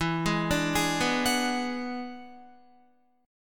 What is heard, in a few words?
E 9th E down Guitar; arpeggiated